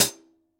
Hihat Closed

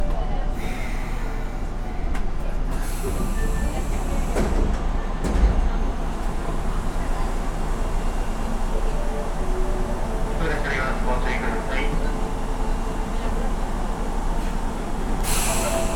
Japan Metro

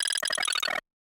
Videogame sounds

